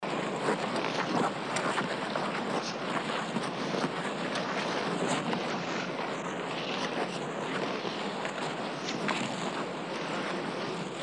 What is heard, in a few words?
close up skating